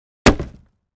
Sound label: Large Object Hit Sled